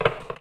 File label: drawer close